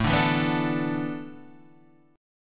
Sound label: A Suspended 2nd Suspended 4th; Asus2sus4 Chord {5 5 2 4 3 5} strummed